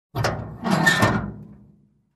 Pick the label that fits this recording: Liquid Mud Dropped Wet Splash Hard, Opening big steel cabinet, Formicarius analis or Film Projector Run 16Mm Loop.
Opening big steel cabinet